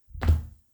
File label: Landing Hardsurface